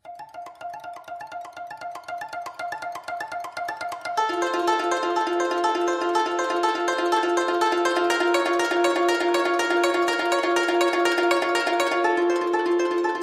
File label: Guzheng; china; Instrumental Version